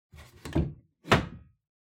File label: Pendulum Clock Hardware Close